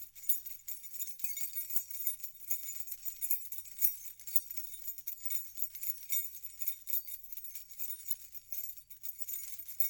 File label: keys